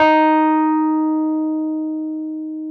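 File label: Wurlitzer electric piano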